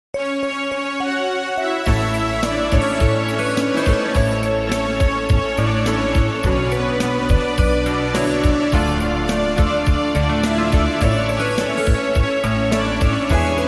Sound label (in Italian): suona suona violino